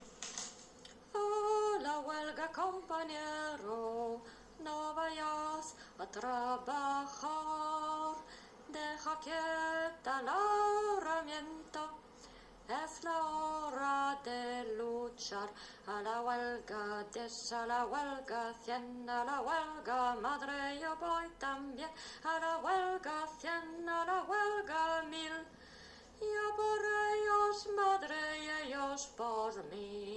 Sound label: huelga sop toulouz